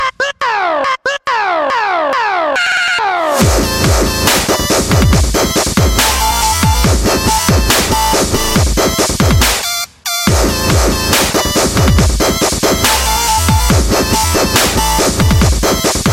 Dubstep

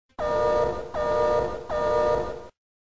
Warning

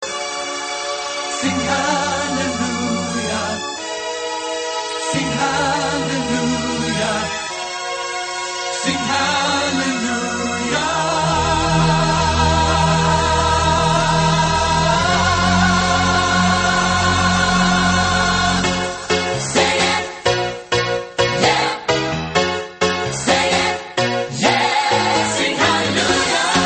Sing Hallalujha

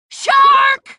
Fizz

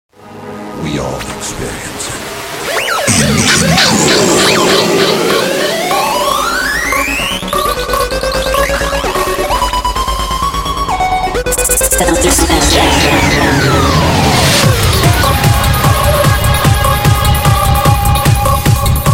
Techno

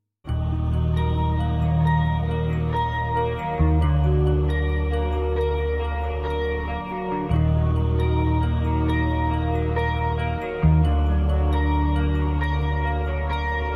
Bell Bass

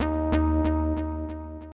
Ending Echo

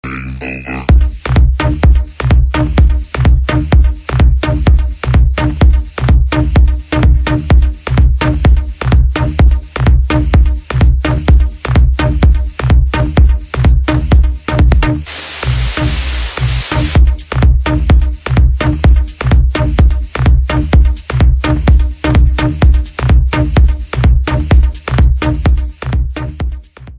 Game Over

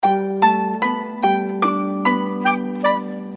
Sweet Sms

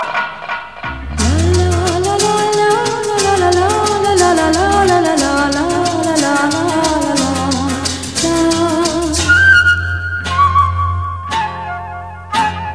Melodious Lady Voice

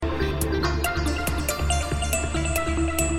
Simple Sms

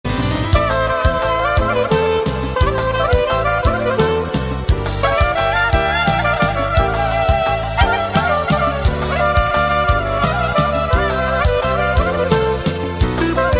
Kavkaz Clarinet

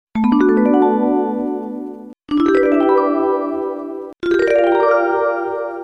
Bells Effect Sms

